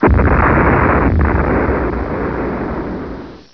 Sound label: explosion; environ; Gunlok